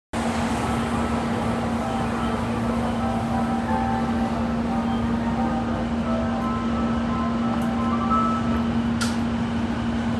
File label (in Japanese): 京浜東北線東十条北, train sounds